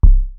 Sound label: Bass Drum, Cwejman S1 MK2; 300 Drum Machines